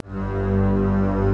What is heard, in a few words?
sounds music; Halo Combat Evolved; Xbox